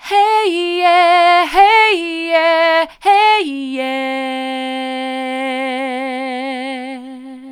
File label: hey mid, vocal